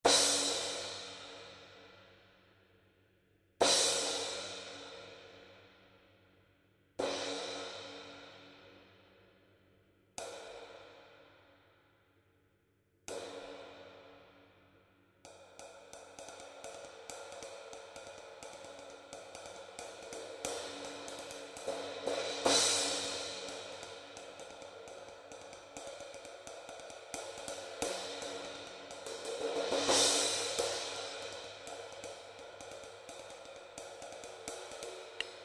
18" Black Pearl Thin Crash, Bosphorus; cymbal

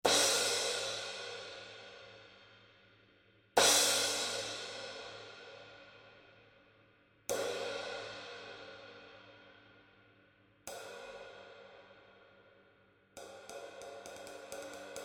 18" Avanos Crash, Bosphorus; cymbal; Avanos (Cappodocia); 1306g

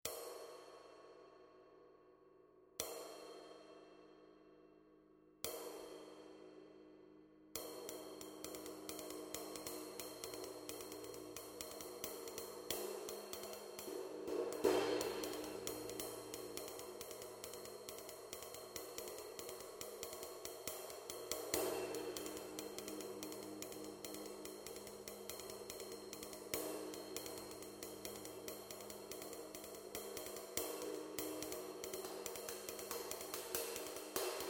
22" Master Vintage FLAT Ride, Bosphorus; cymbal; 2344g

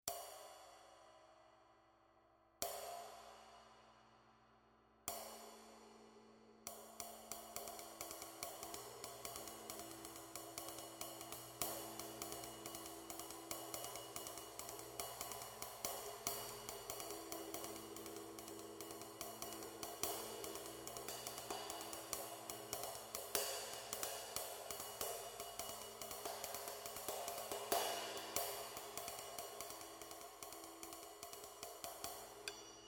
20" Master FLAT Ride, Bosphorus; cymbal; 1850g

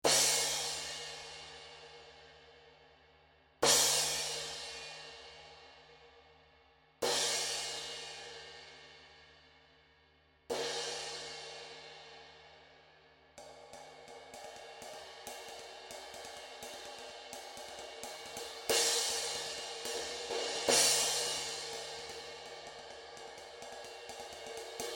16" Trad Thin Crash, Bosphorus; cymbal

